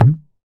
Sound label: Dholak; Roland BK-5; Percussion